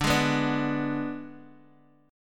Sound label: G sharp Diminished D